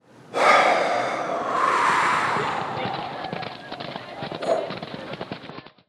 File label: ritual release horse